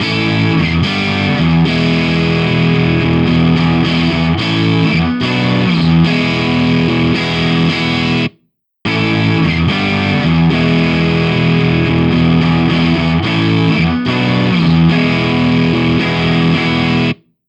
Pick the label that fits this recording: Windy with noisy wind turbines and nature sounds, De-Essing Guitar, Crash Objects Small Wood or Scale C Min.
De-Essing Guitar